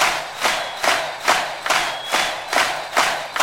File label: Crowd Effects; Vengeance - Essential Clubsounds Vol.4; 140BPM